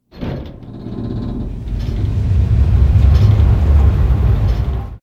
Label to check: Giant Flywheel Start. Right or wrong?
Right